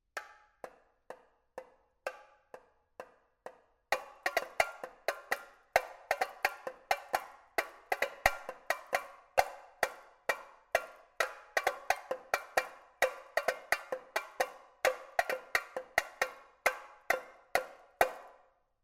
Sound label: Tambourim, marcha